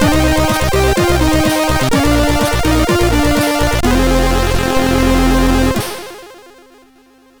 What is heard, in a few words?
sid MOS6581R2; Starfysh